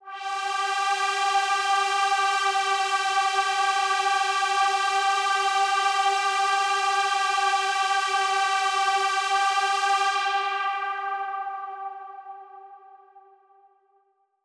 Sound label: Big Pad G3; KG MegaSaur